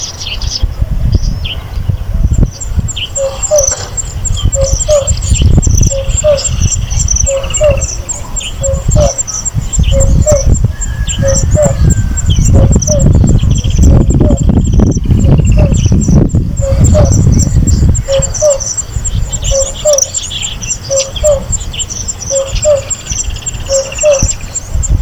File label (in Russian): afrikanskaya kukushka cuculus gularis